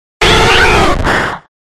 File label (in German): Ruf